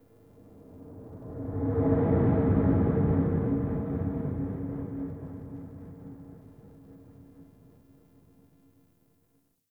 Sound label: Wind Blow; Warframe; Tau